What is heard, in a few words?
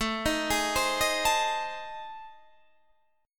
A Minor Major 7th Flat 5th, Guitar Open D